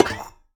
hurt, coppergolem; Minecraft

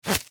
leash, leash knot

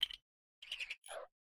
spin, coppergolem; Minecraft

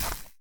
place, dried ghast

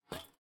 step, copper golem weathered; Minecraft

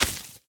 unleash, leashknot

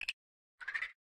spin, copper golem weathered; Minecraft

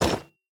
equip copper, armor

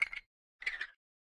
spin, copper golem, oxidized; Minecraft

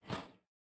step, copper golem, oxidized; Minecraft

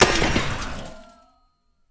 death, copper golem, oxidized; Minecraft

